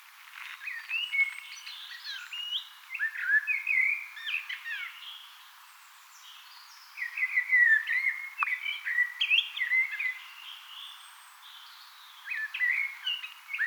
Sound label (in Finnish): keltanokkarastas laulaa